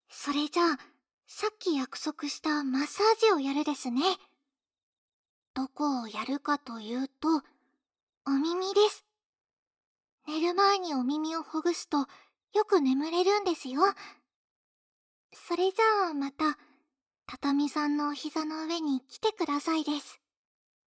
マッサージ; マッサージをやるですね！

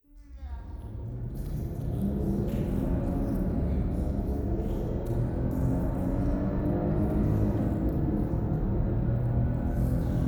フェラチオ 車の中

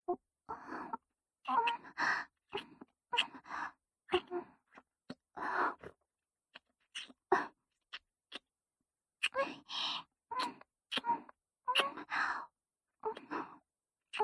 キス; BGV

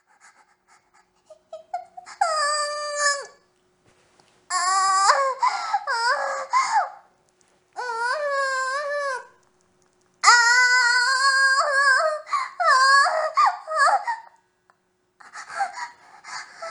女子大生の喘ぎ声; 媚薬盛られてセックス漬けにされる女子大生の喘ぎ声がヤバすぎる

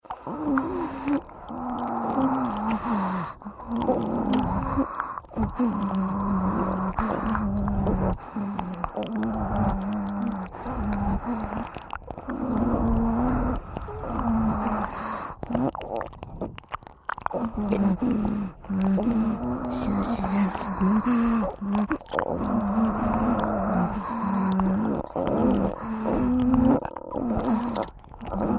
両耳舐め＋両耳元オホ喘ぎ; 耳元オホ声、耳舐めループ; オナサポ

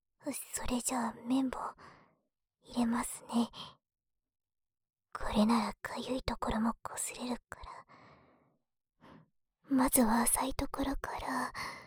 左 綿棒ゆっくり, ASMR

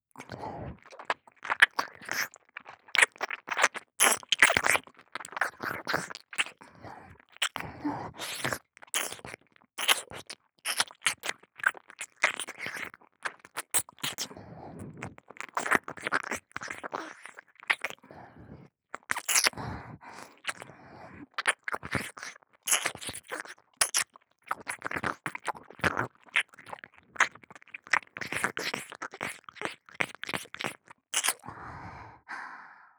左接近・耳舐め・激しめ; リーシャ

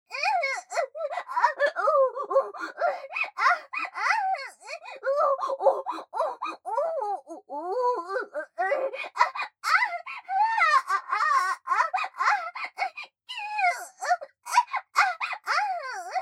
喘ぎ声 ふつう

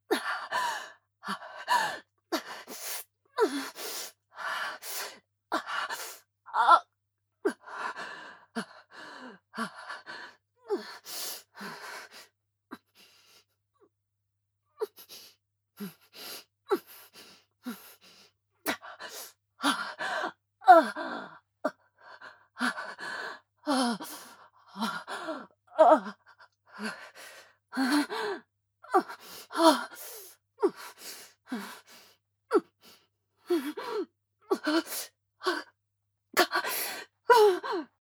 挿入中・息遣い・通常; アスティリアに挿入中; ヘレナ

